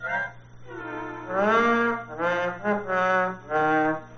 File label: Nah Nah Na Boo Boo